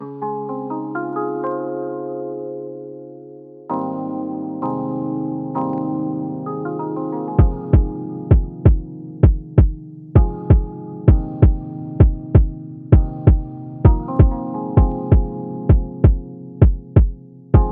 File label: Instrumental